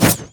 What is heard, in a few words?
impaling charge leap; LoL; hecarim